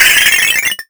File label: Glass Heavy; lange-nacht-2009